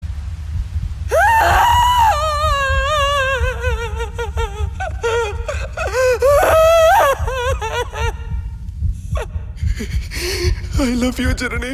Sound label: crying; shruti hassan; 3 movie